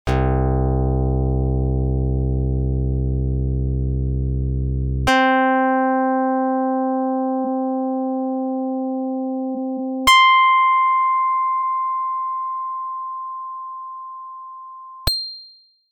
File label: karplus 2